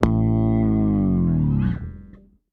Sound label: FALL A1, BASS, EFX FALLS